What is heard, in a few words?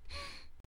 Breath; Lewd SFX